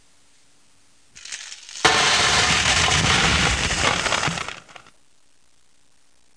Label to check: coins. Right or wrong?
Right